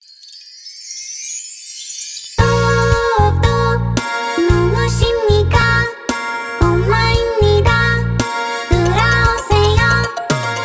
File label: Jump Rope Song; Squid Game 3